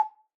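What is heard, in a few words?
ocarina sustain A4 release; Aerophones; Edge-blown Aerophones; Releases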